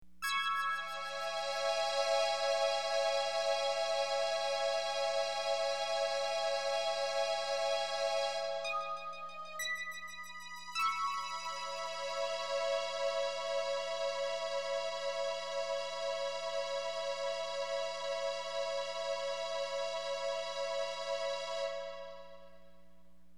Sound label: drone